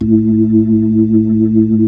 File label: B-3